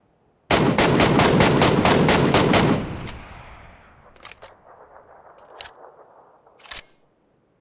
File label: Mk14 single